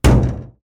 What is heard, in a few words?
hit